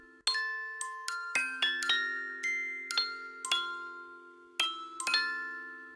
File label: jingle